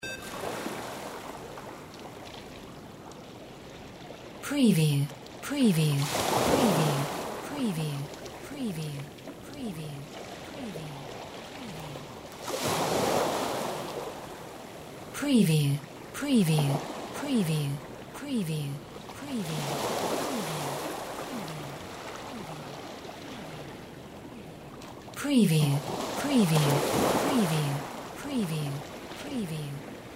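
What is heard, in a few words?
WATER SEASHORE WAVES; windy beach audio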